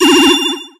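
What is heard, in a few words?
Battle Skill; Etrian Odyssey III HD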